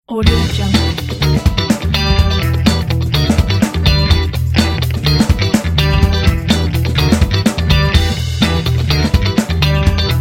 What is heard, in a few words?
funky logo intro